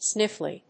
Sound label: sniffly